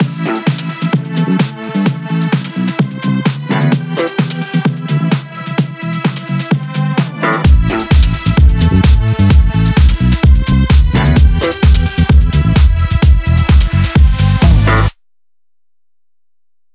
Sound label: 129BPM Hiphouse Mix; VFH1 129BPM Hiphouse Kit